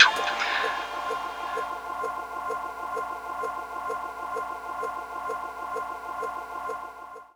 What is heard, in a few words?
SFX VOX; Rawcutz; Nu Forms